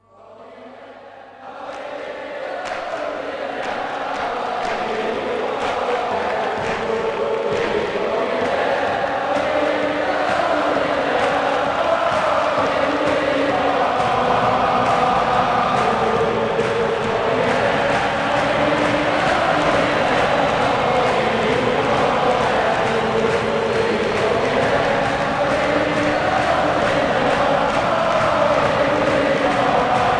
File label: Chants - European Type